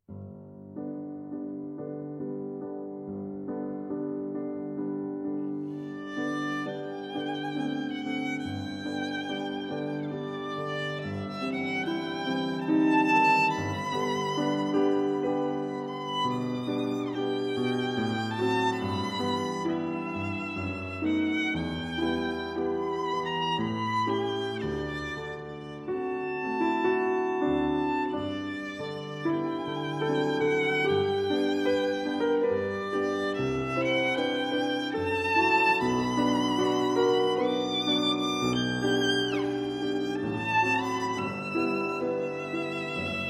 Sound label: melodie; paderewski